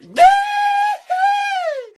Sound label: YEEEEHEEEE